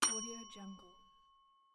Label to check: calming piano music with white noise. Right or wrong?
Wrong